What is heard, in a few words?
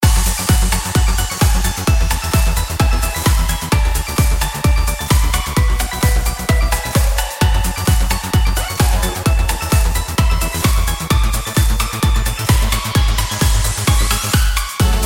trance